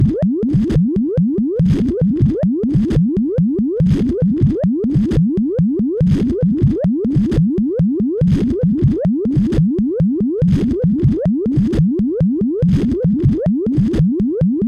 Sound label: techno